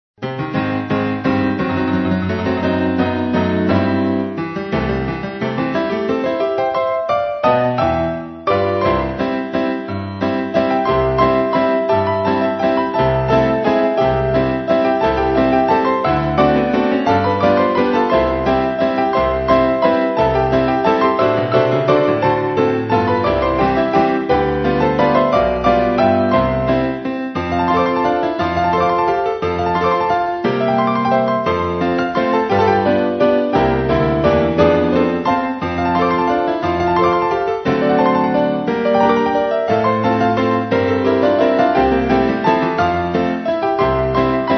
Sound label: Aeolian Piano Roll